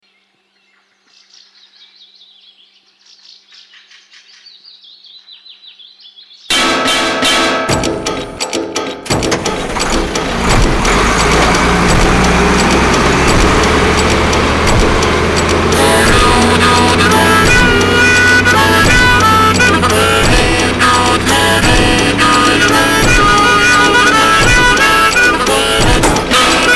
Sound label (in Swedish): Balksax blues